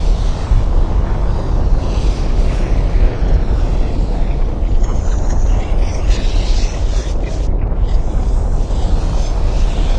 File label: lava; keenhalloween